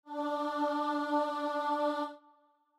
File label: starting note, voice; vivace in d major